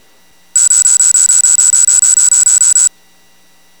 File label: Lock; Shopping cart; Gatekeeper